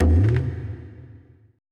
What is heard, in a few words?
TABLA C1 L; BPC DRUMS